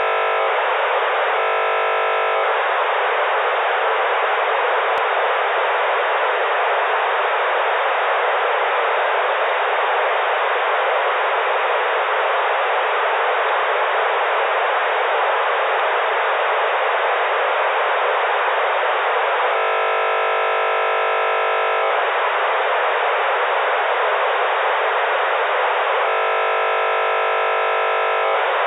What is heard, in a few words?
TETRAPOL MX